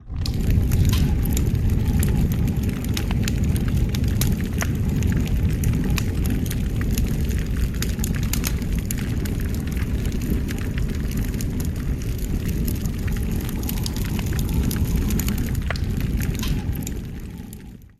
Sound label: Large Fire; SoundsCrate